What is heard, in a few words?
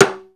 CLSD RIMS, Timbales; E-MU Producer Series Vol. 2 – More Studio Essentials; Composer; Timbales Xswitch